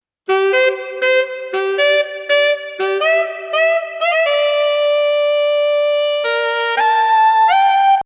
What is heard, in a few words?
Lead